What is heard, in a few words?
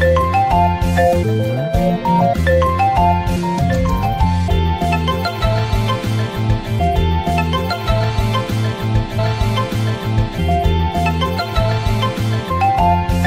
vivo V19 Ringtone